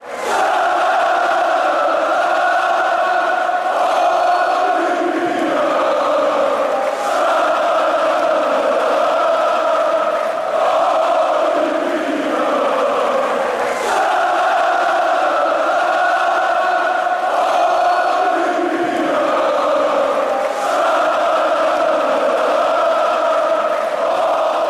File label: salalalala, chants; olimpija